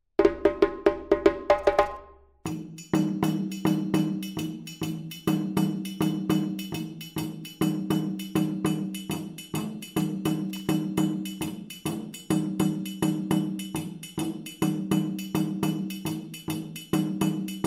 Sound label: Djagbe Dununs, djembe